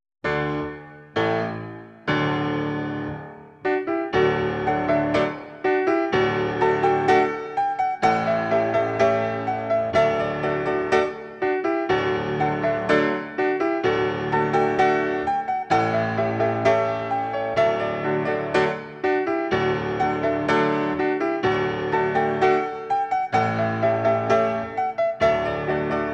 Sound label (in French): grands battements